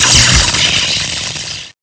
ヒット音; ガイスールシドーヒット音; super otousan

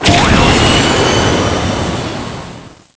ジャンプ; フロッガプレスジャンプ; super otousan

